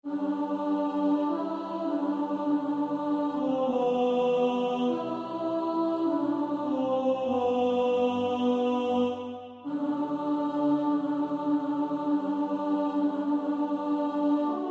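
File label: alto, practice music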